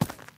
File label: stone footstep; everness